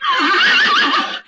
horse; Animals

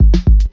eight oh eight, Kick Snare Drum loops

